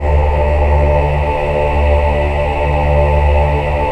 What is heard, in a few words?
VIB D2, VIBRATO, Voices; Spectrasonics